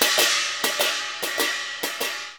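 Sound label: CHINA, China cymbal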